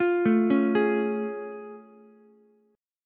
A Augmented Major 7th A, Ukulele; arpeggiated; JGuitar

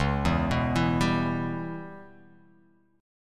C sharp Minor 7th Flat 5th

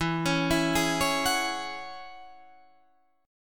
E 6th Add 9th E down Guitar Open D

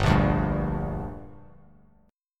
G Minor G; strummed; 3,1,0,0; JGuitar

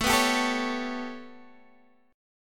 B 7th Suspended 2nd Sharp 5th, chord

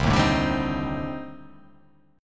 C Minor 6th Add 9th C

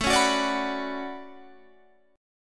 D Major 13th A, chord

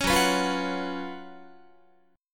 F sharp Major 7th Suspended 4th, Guitar; F#M7sus4 strummed

